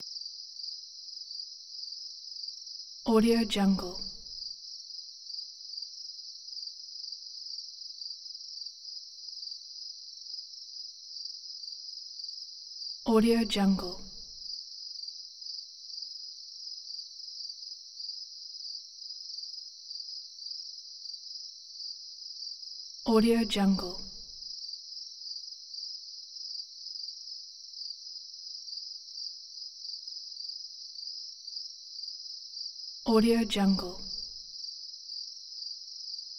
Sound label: cricket night bandpassed medium sparse